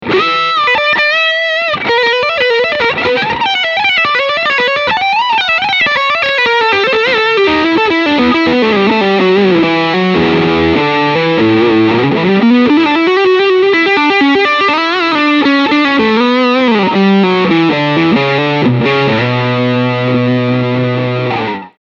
High Gain Lead

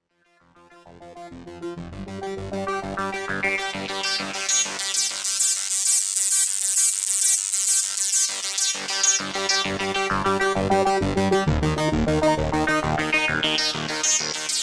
Arpeggio; Fizmo

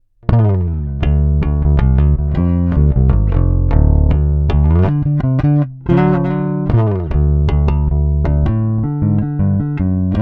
Clean dry

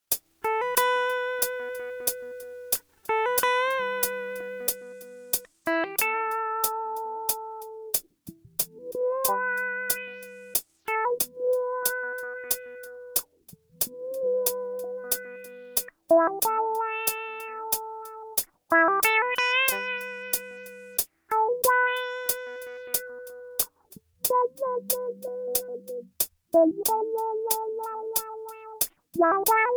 Boss RC 202 git Low Pass filter

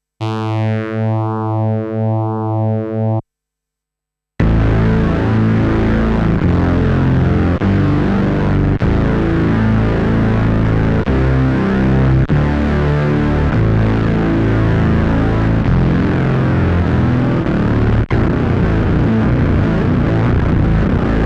synthesizer; eventide h90

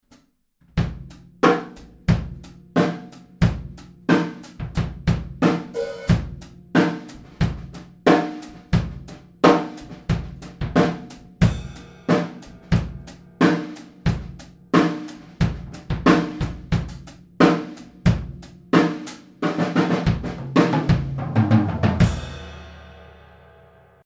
Drumkit 3 Mics; Roland R26

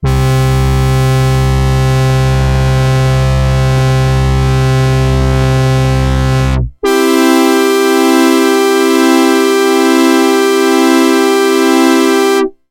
P8 Plain Square Mix

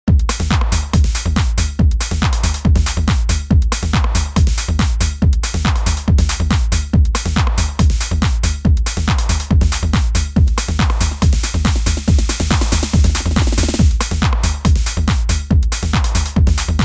Hard House 110 Happy Hardcore

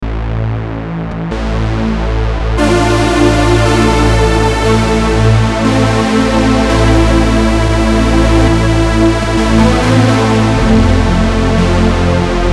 pad

